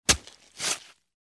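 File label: sword thrust in and blade pulled out; Звук мечей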